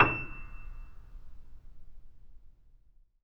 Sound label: PP E 6, Grand Piano off mic; AKAI S6000 CD-ROM - Volume 2